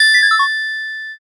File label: ice magic